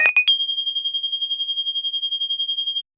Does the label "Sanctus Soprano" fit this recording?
No